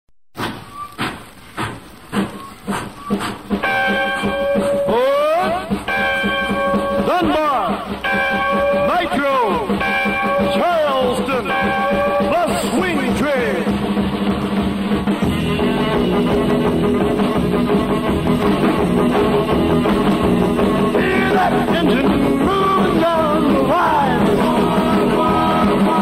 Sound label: swing train twist